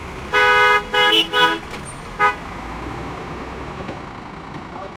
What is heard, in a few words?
bus handbrake, brake; man